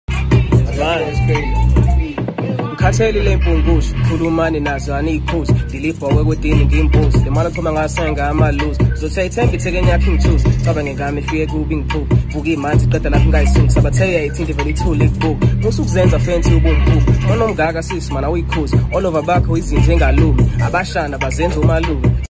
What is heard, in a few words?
Zulu Rap Style